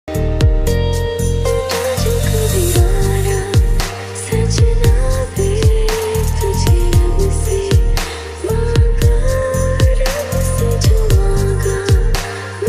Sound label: ringtone romantic ringtone lofi tone